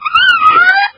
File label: poison crab idle; svencoop; hl2